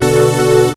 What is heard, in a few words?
HIT, STABS, House